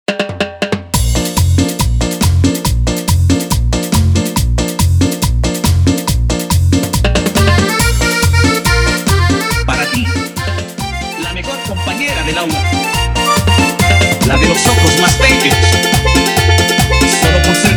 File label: Instrumental BPM 140; Dimax Dj